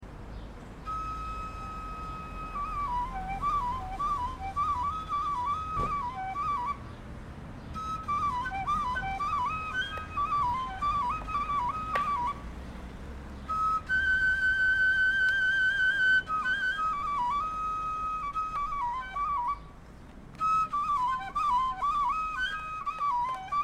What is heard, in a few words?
cyborg Flute; antiatlas-expe